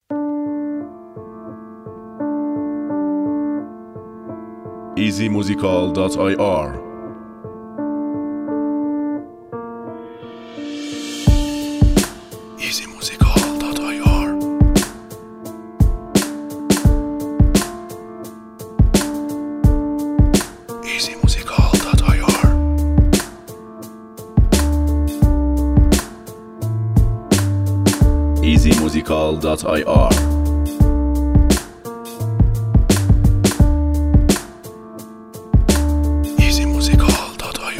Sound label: Beat